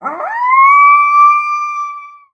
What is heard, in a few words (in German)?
hund bellen, chihuahua hund jault